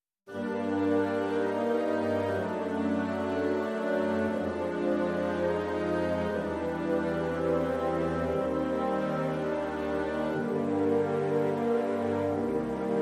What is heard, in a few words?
Orchestral Mix